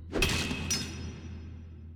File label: picker End; fairymirror